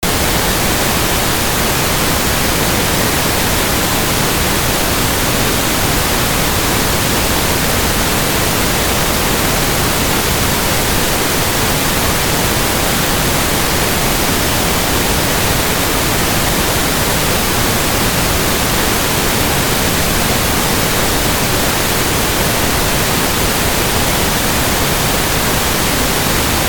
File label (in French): bruit rose